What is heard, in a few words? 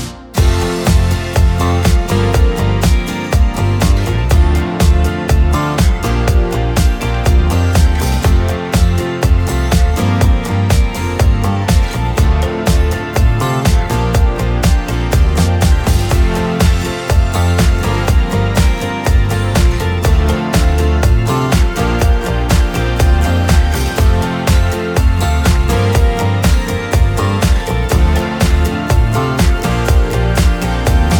Instrumental